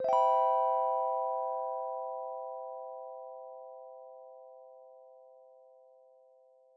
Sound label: electric piano mellow c chord; Piano and Epiano